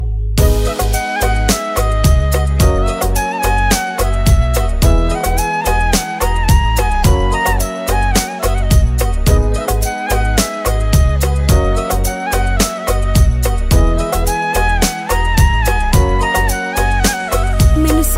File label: Flute Version Ringtone